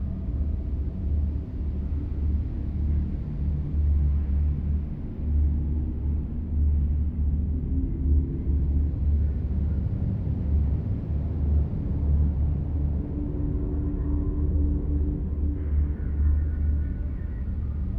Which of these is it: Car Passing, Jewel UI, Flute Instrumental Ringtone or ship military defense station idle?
ship military defense station idle